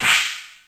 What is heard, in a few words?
SNAP; Crypton - DrumBase5000